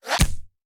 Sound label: Bullet Impact; Sound Ideas; Guns